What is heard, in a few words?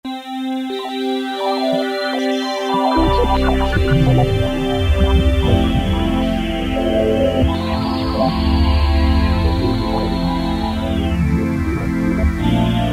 pad-PulsTrip; E-mu Orbit V2; Factory presets